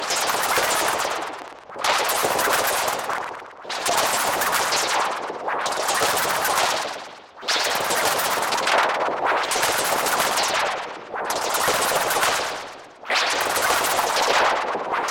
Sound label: sewer texture glitches